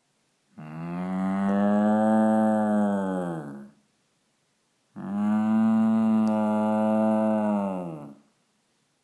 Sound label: Cow Noise